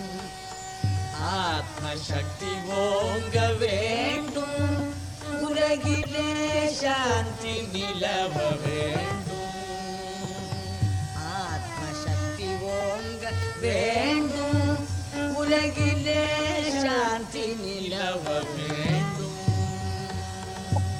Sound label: thillang